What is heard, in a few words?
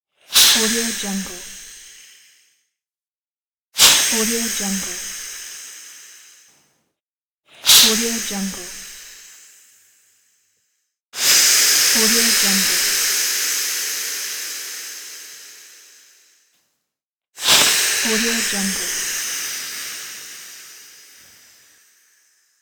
steam; sounddivision